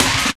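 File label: snare drum, Drum & Bass, 160 BPM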